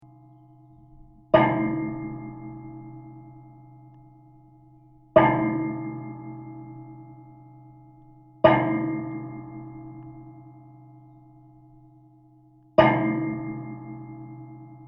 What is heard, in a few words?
Big contact metal